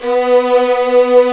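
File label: strings, 8pc; String Master Series; Vlns 2-5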